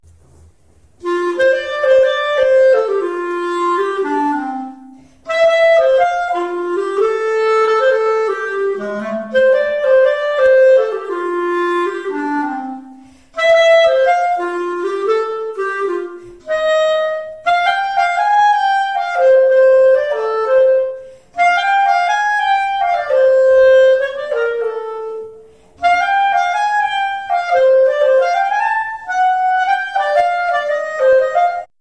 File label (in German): klarinette